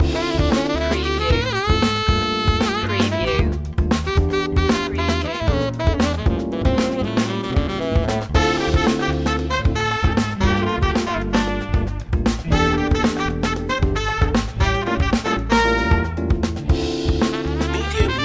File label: high funk loop